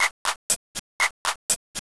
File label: Drum Song; Mythos